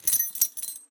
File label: foley keys belt metal jingle; MONO; ProSoundCollection v1 3